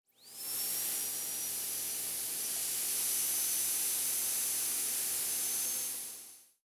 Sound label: Industry Tool Drill Dentist Drill